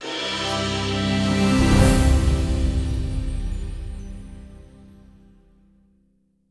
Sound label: Quest End